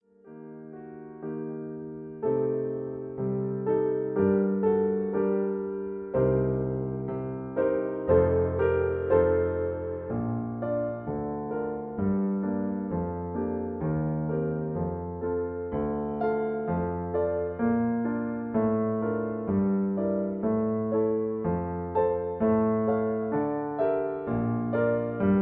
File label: Accompaniment G